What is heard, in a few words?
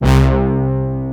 VOY8 BRASS, VOYETRA 8; Greytsounds VOL-1